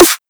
Snare

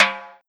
Perc Timbal

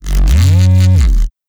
Sound FX Reese Acoustic

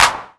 Clap Fat

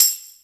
Tambourine

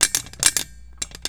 Trumpet Valve Click; Geraramirez

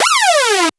Trap Synth

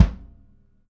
Kick Death Metal

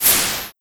Noise Crash SFXR

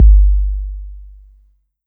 Kick 808

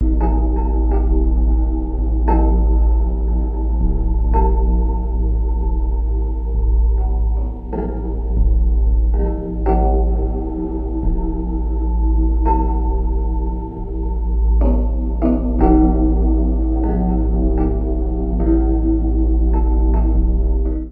Sound FX Sci Fi Ambient

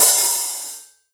HiHat OpenCrash Acoustic; Colombo Acoustic

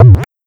Glitch Bass

